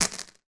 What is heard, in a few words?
household matches handful drop on surface